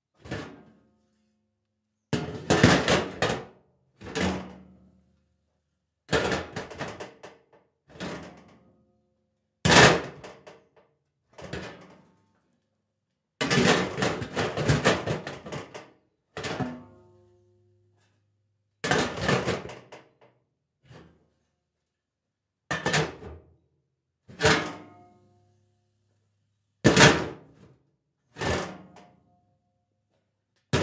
trash can

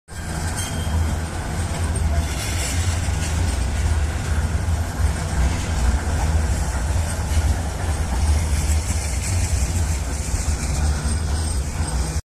tram crossing

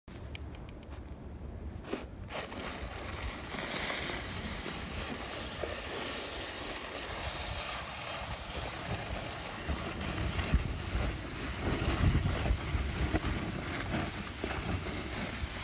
Shoveling Snow

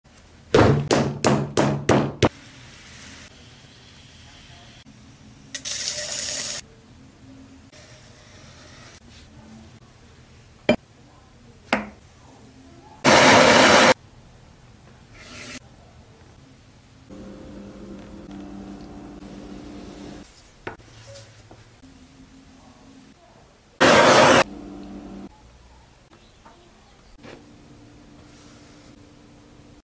pottery

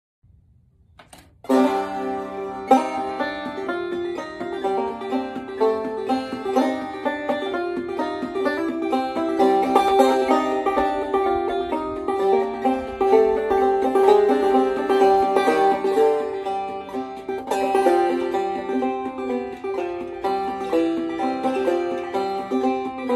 banjo

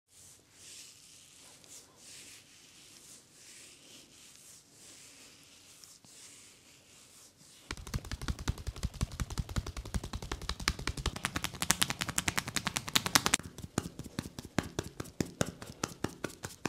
Massage

